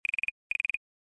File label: Relay, ringtones